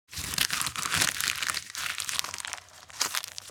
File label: Paper trash rustling garbage; household